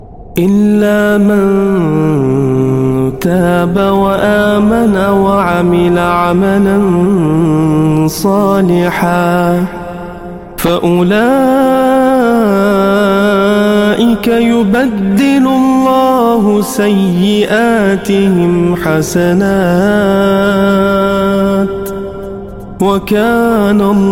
Surah Tauba Islamic Ringtone

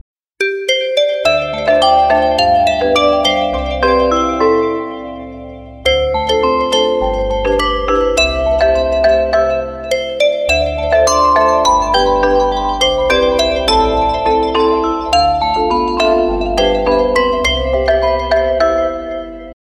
oppo ringtone

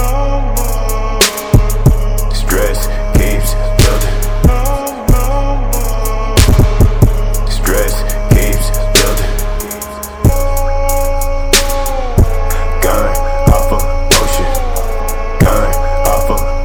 Russian Ringtones

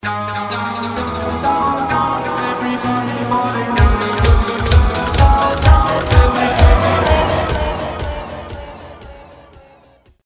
down down electronic ringtone

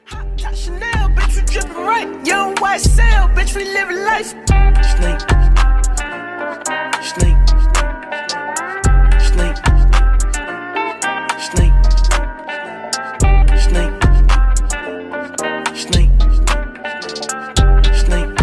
English Ringtone

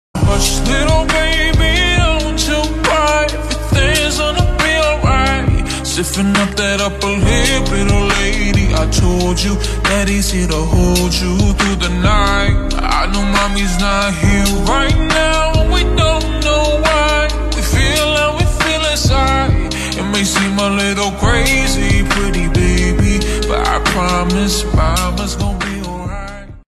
Slowed Reverb

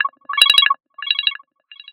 Beacon ios 7 Ringtone

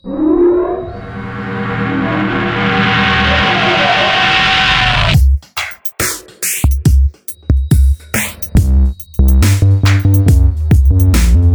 Electronic Ringtone

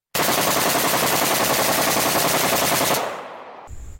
AK 47 Sound Ringtone

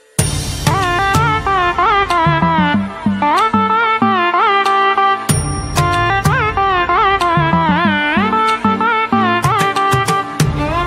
Flute Music Ringtone

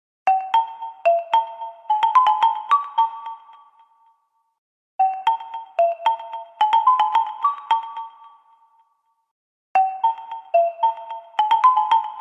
Vivo X90 Pro Plus ringtone

